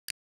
zip click

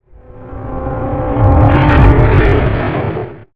fighter sound

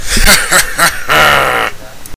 evil laugh

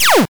laser

